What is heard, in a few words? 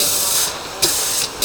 MACHINES